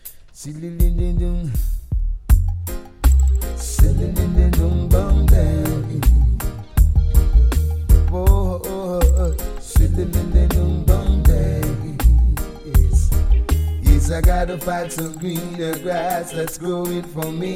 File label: riddim